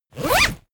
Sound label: zipper open close